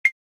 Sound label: ui click